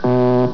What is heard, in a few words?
wrong guess; usa